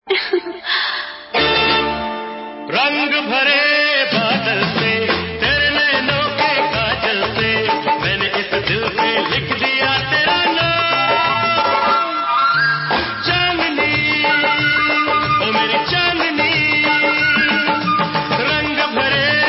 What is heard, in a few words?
song ringtone